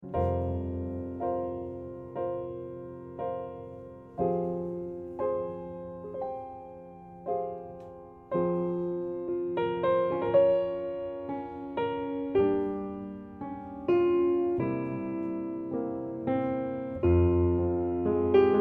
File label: Lullaby; Full Metal Alchemist